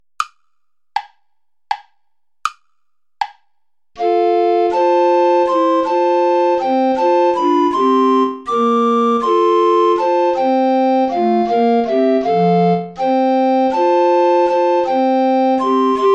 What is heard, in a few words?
play along without alto recorder